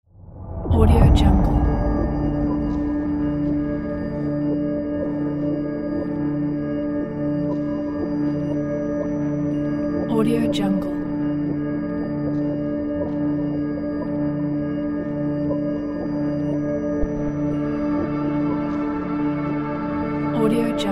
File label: strange drone; archisound